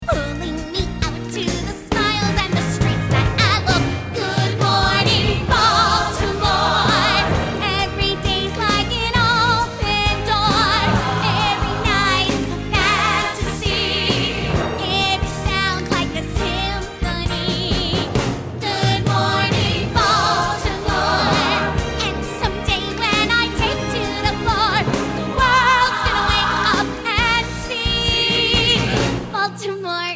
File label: Vocal Guide Track